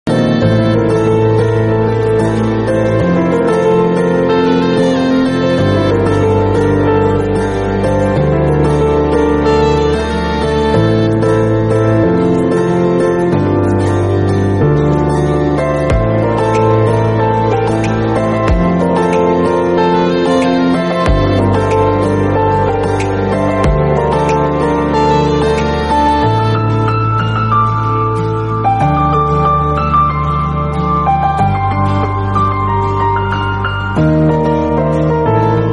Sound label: Instrumental